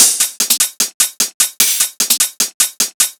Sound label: Loop; Valvesque